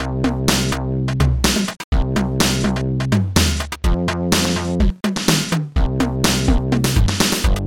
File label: just a rythmn